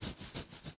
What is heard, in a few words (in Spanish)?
RASCAR CABEZA 3 VECES; Yesterday Origins